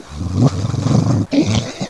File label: pit drone hunt; svencoop